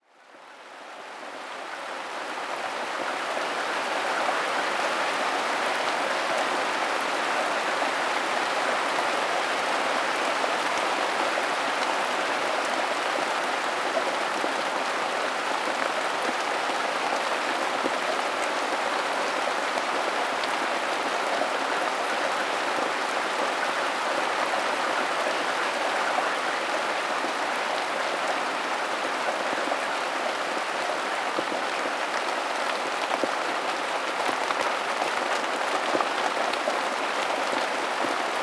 Rain Sounds

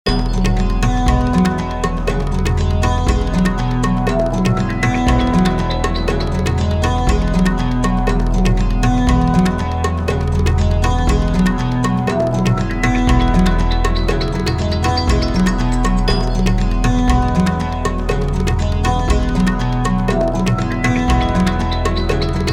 Circling Guitars

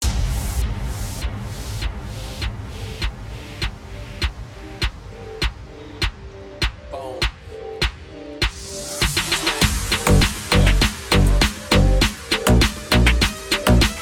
Remix

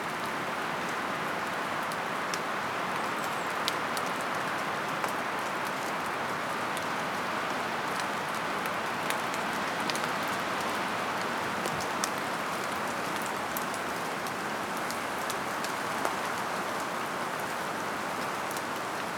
Binaural Beats Brain Waves Isochronic Tones

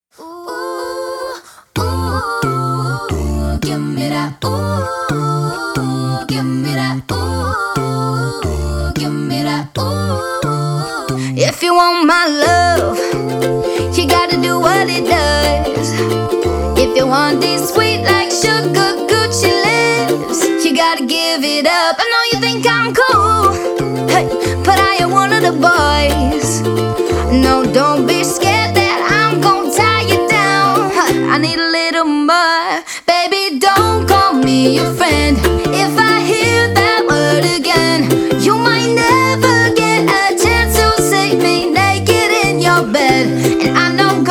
Acoustic

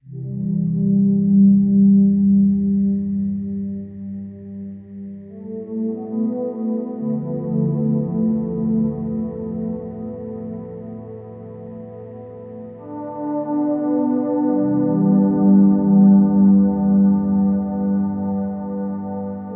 Binaural Beats Brain Waves Isochronic Tones

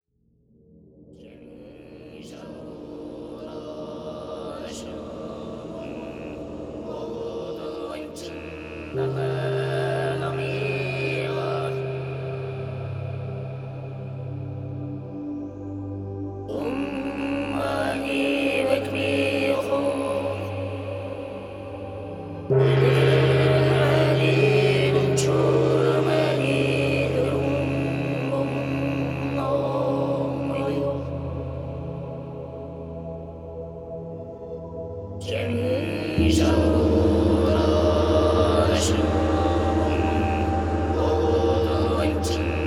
Sleep Music, Sad Raindrops

